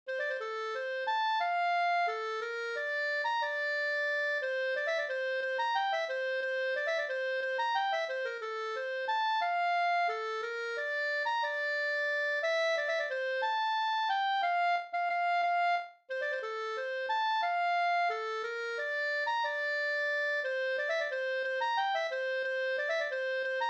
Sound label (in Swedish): vals bondvals